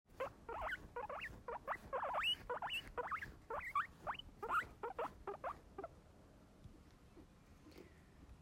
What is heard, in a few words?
brushing, メルはブラッシング時、3 kHz近い高さの声で鳴いていました。; 頭以外の場所をブラッシングするときよく鳴いていた。やや苦しそうに見えました。; 2歳0ヶ月12日; guinea pig; ブラッシングされている時のメルの音声